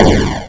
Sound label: explosion bit; alunizaje